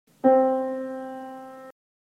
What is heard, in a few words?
C Piano; musical tone perceived dissonance